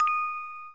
orgel

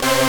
synth; osu

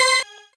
normal hit normal

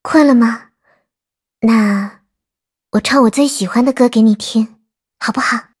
applause

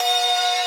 synth main

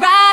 vocal

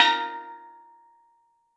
Steel Drum Bb5

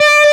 Left-hand vibrato; osu

